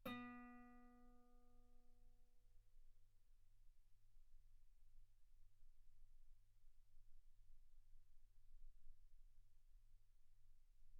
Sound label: Guitar B3; ff; sul B